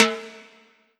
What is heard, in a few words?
Korg N1R High Timbale